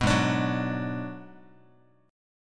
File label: Ab Minor 7th Flat 5th Ab, down, Guitar, 4A, 4E, 5A, 5D, 5G sharp, 6C sharp; strummed; JGuitar